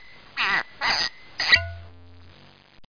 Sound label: Cork; Broderbund Print Shop Multimedia Organizer Deluxe Edition